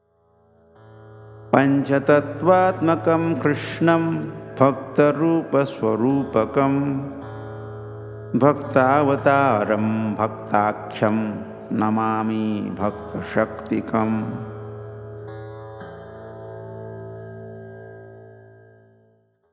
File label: Shloka tuned